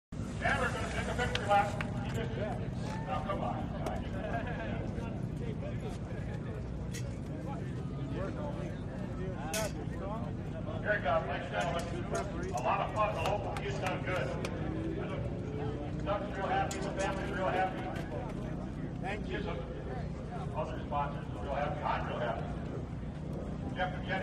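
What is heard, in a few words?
Motorcycle Finish Amb, road racing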